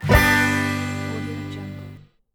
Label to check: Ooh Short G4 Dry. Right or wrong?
Wrong